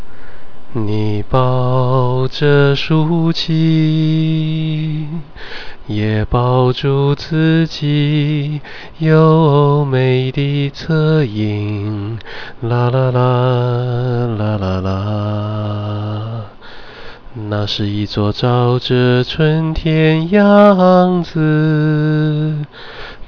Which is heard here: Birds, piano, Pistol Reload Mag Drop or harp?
harp